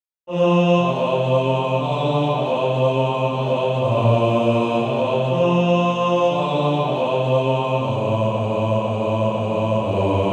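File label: Male Choir, bass 1; Sweet Hour of Prayer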